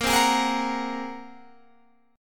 A sharp 7th Suspended 2nd Sharp 5th, Guitar Standard chord; strummed; JGuitar